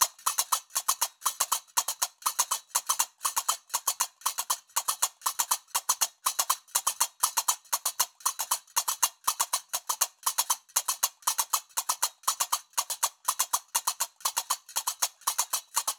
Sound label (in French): loop binaire tempo 120; Qaraqab; qraqeb; Maroc